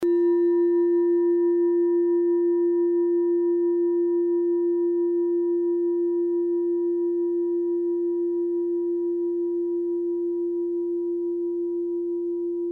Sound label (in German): kleine klangschale